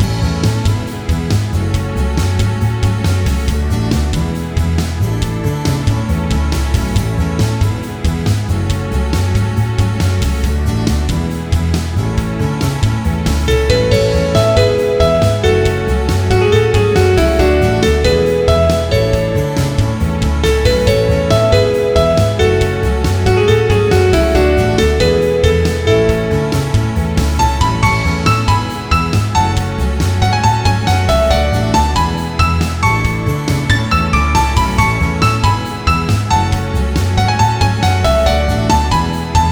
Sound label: BGM